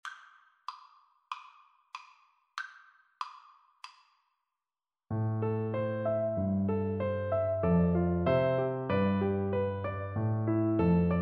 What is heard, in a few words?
the foggy dew, Flute